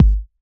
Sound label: Bassdrum